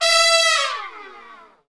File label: BRS, TRUMPETS; Roland LA; Roland - LA Composer vol. 1